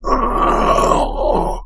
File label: attack